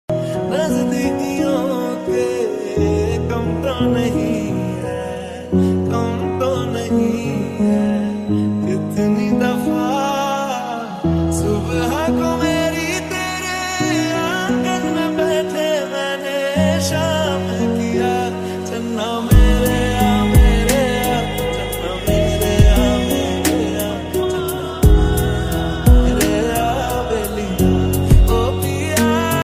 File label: love mobile ringtone romantic music ringtone bgm mobile ringtone hindi song